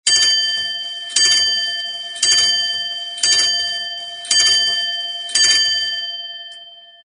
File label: mantle clock large chime